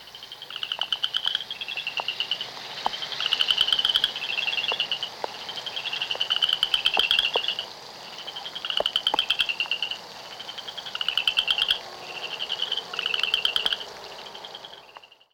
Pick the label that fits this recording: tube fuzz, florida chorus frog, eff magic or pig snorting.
florida chorus frog